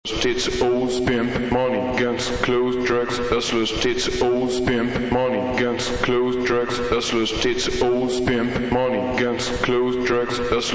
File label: acapella - locked